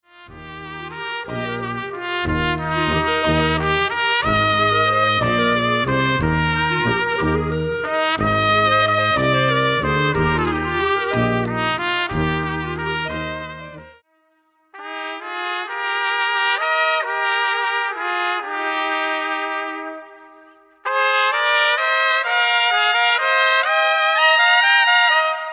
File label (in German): Trompetensterne